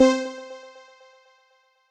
Crumar Violin Piano Layered, String Machines, Crumar Orchestrator; Ableton; Factory Packs; Retro Synths